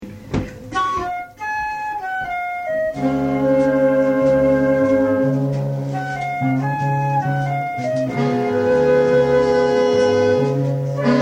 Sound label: blues